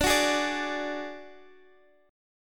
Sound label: D Major Flat 5th; strummed